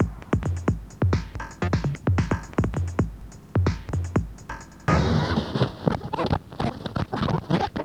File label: beat